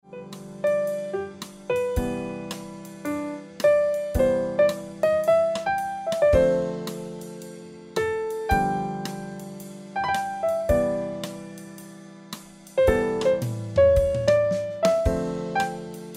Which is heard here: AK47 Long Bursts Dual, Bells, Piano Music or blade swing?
Piano Music